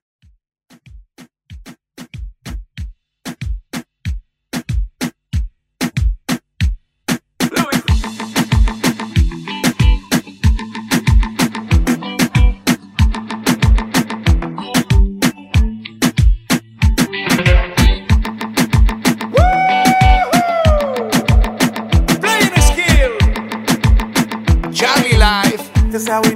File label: Reggaeton; Redrum